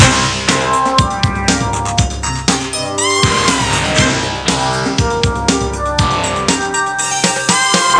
Mean Jam; Spaceship Warlock

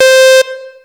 lead, synths

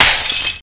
glass brk

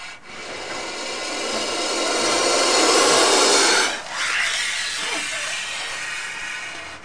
COBRA

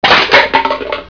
CRUSH, robots

